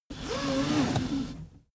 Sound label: car seatbelt pull slow